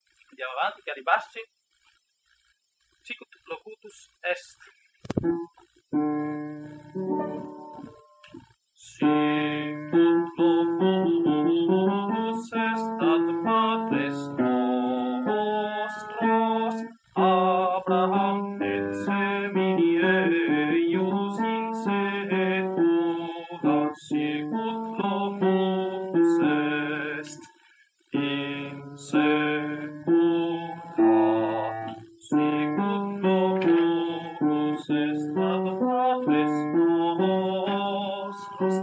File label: Magnificat; Bach